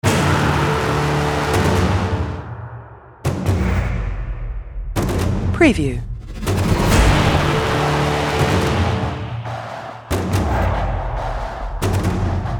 Cinematic Action Fast Tempo Mechanic Drums Trailer